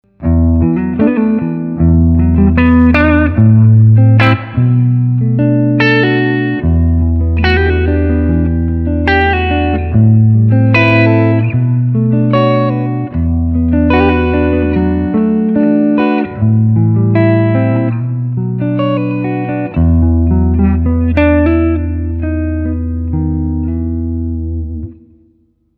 Mood Jazz

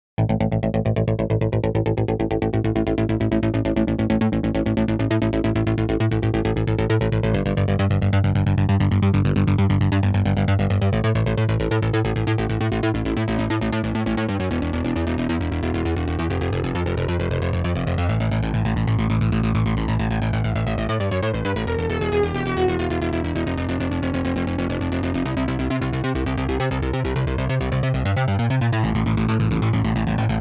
bass only

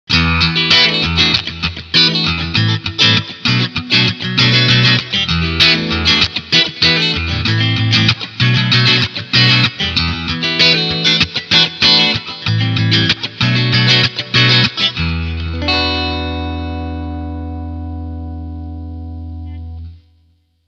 Clean Strumming beide PUs

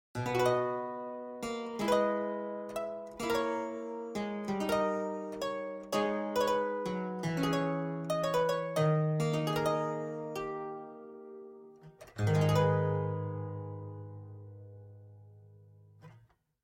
English Harp Lute

